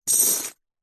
foley keys large bunch prison guard set down on carpet